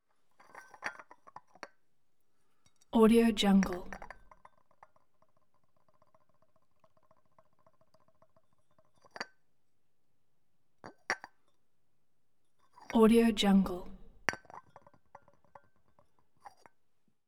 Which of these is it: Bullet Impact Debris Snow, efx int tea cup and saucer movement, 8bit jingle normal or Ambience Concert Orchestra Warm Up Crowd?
efx int tea cup and saucer movement